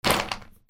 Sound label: FOOTFALL